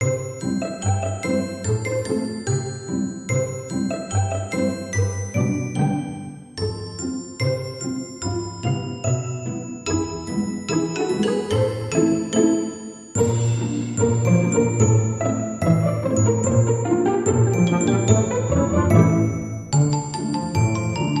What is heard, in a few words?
Glockenspiel